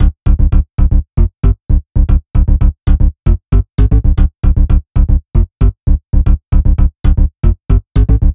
Bassline; Drums & Drones

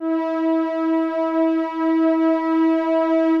PAD E5

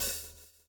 swing

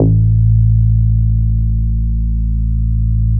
A1, BASSES

